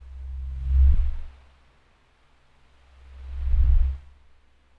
Blowing Across A Fan

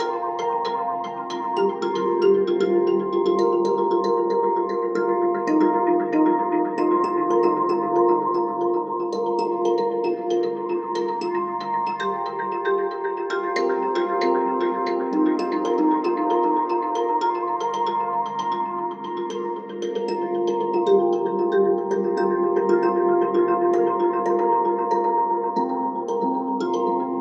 Background Mallet Loop Cm 115

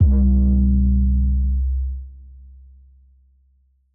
808 (C2)

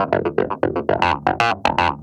118bpm Clav Loop Fast Funky Fm; Purple

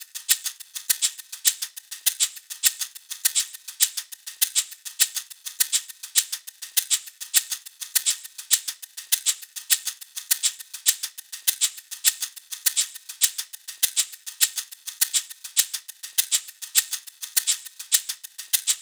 Drums 102bpm Shaker Loop Afro

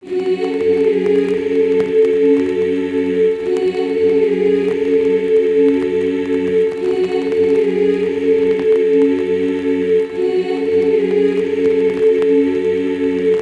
Voice 143bpm F m Digital Choir Trap

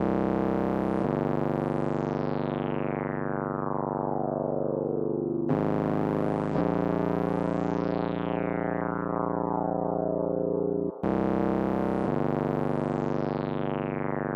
174 Cm Resonant Juno; Luther

